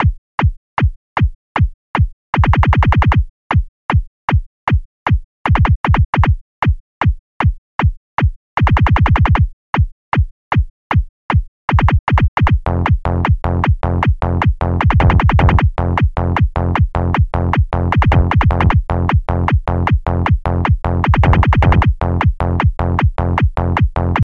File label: Synthesizers SERUM 154 bpm; Generator Reaktor; VSTi; SERUM v1.363 Remake; Techno